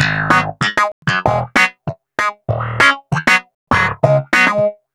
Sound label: Wah Snap G# Rockin, Snap Bass; Basses